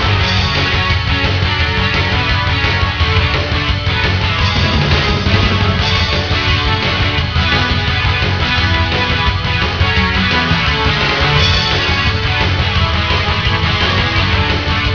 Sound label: theme